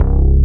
BASS, POWER SYN; AKAI S6000 CD-ROM - Volume 1; SAMPLE WAVE MIXING 2

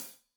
Hat Loose, Hi-Hat, Cymbals Stereo

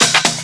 COMBI, Houseworx; Ueberschall; Workz-9

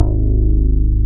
BAS MKS-50, Roland

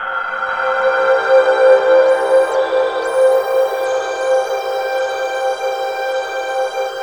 CHAOS C5, Ethereal Atmosphere; USB Soundscan vol.13; AKAI

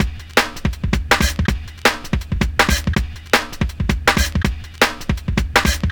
162, Jungle Breaks; Thin; Jungle Warfare 1&2

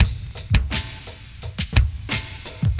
LOOP 86, CHRONIC LOOP, Hip Hop; InVision Interactive; 40 Oz Phat Slammin

